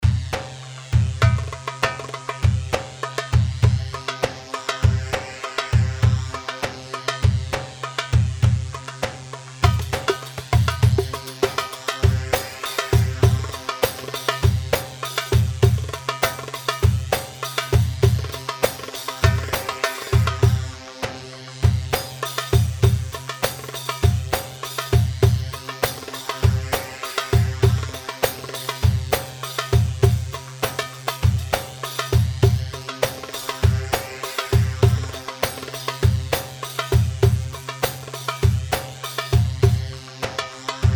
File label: Saiidi 100 mix, Darbakati, Sharqi; صعيدي